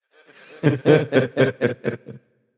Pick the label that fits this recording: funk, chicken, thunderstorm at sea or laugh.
laugh